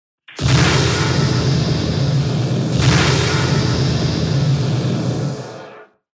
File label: Fire element spell